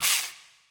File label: armor; smite; arachne skin9